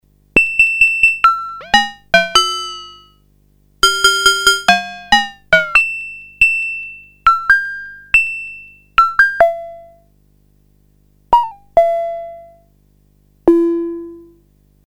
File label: fm bell